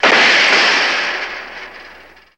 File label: Metal Fence Crash; fences